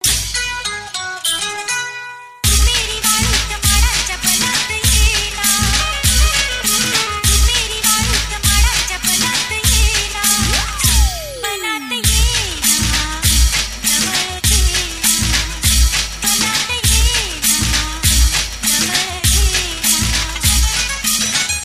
crazy marathi tune ringtone

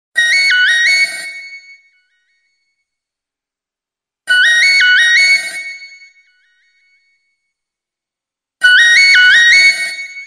do mi so ringtone

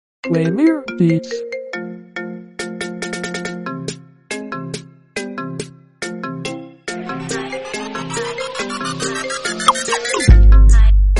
marimba iphone remix ringtone

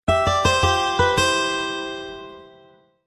old timer sms ringtone

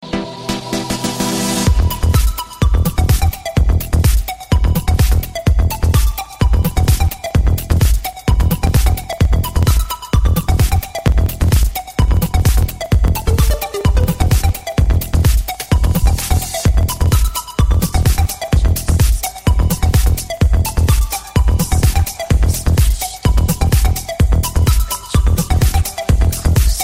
ringtone apple phone x

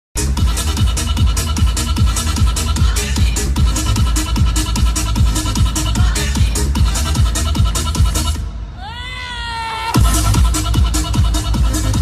trance ringtone

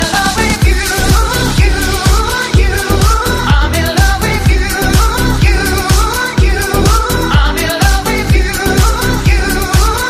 electro ringtone

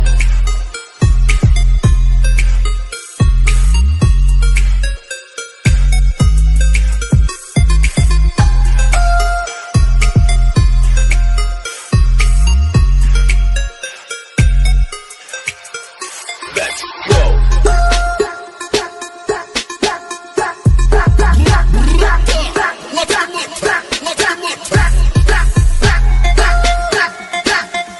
cool electro ringtone

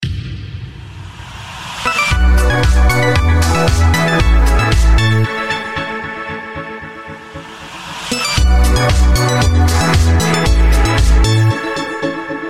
nokia c9 ringtone

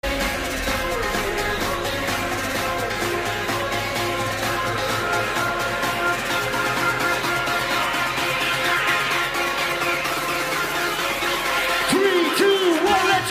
trance ringtone; tomorrowland belgium

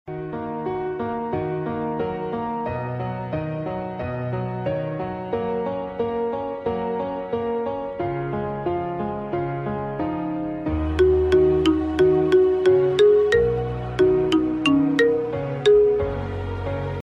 piano ringtone

